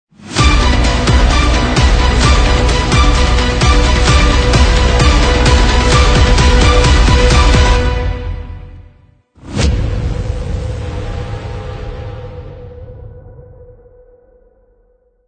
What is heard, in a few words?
Epic Hybrid Cinematic Trailer